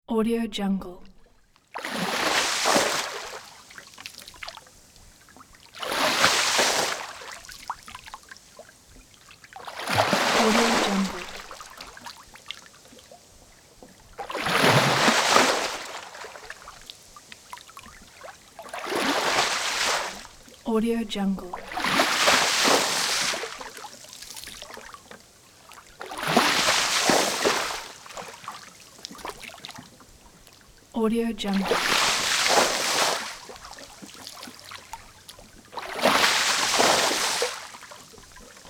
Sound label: water splash